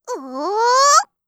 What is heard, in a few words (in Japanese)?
"お～～～！", 客席